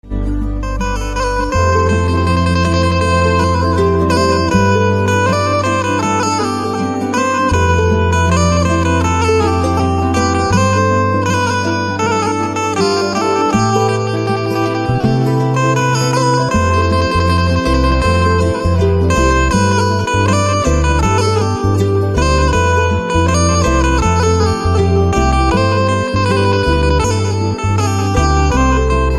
Banjo Instrumental Ringtone

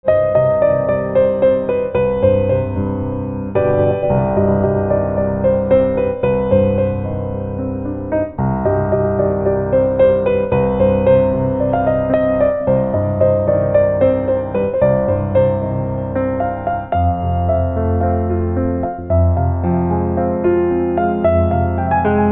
Piano Silent

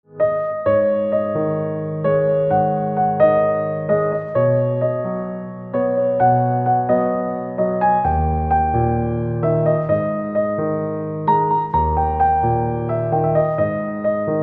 Piano, instrumental ringtones

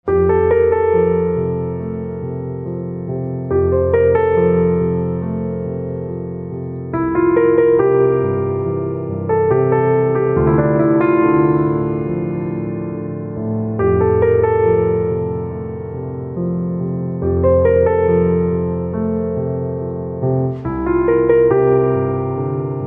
Piano Silent

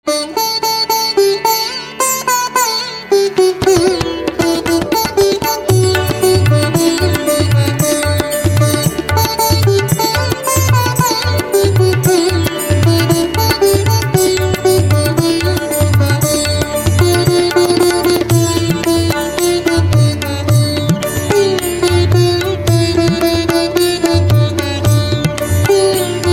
Veena, instrumental ringtones

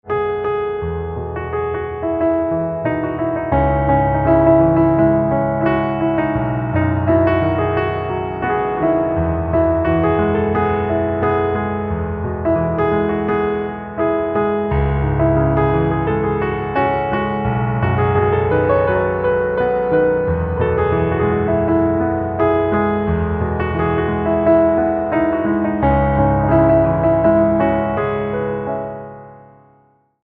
Piano

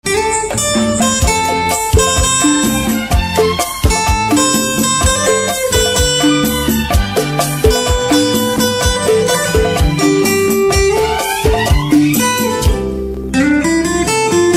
Guitar

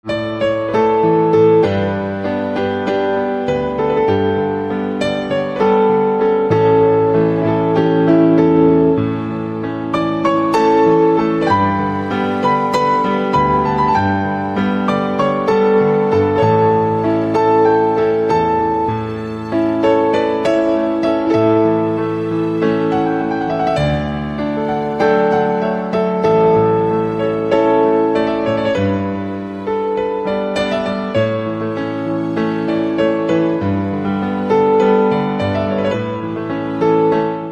Piano Slow

